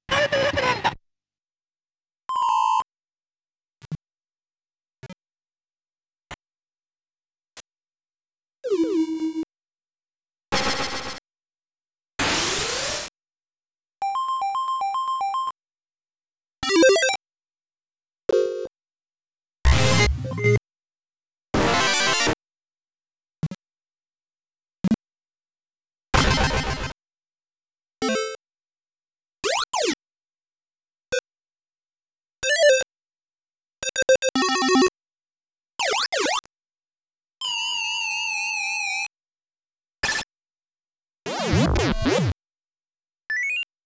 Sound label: VOICE & S.E.; Namco Sound Museum from X68000